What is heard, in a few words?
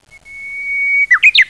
Bush Warbler Type H